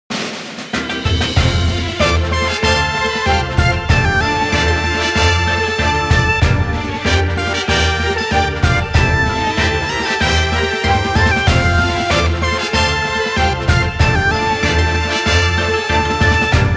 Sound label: Jingle Story Happy; Taiko no Tatsujin Wii Ketteiban